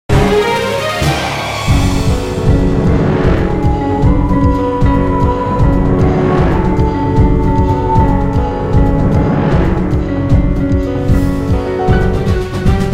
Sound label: Fight Win; Silas; The Da Vinci Code; XBOX